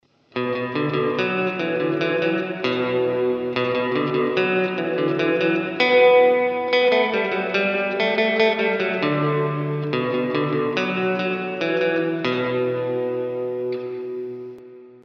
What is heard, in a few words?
Cave